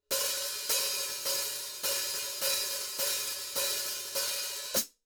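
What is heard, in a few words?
14 hi-hat OPEN, cymbals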